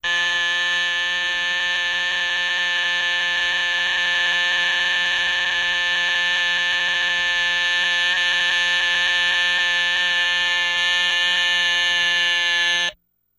Doorbell Obnoxious

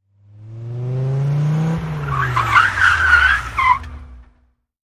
Accelerate Quickly, sports car

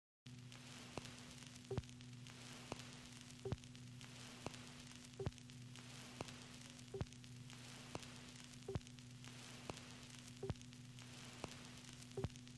Vinyl Record Surface

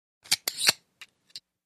Safety Belt Click, seat-belts; accessories

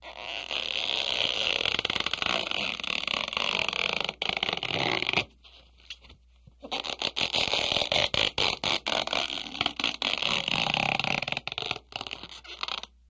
Balloon Stretch Smoo

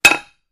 Metal Impacts Dull, pipes

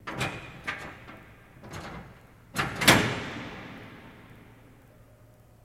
Prison Door Lock Mec; jail & prison doors

